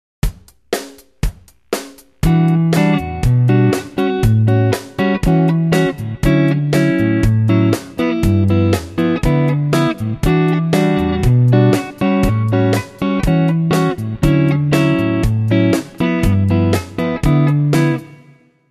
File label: Rhythm Guitar